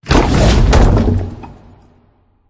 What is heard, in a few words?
Soldier Vehicle; the great ottomans strategy battle for throne android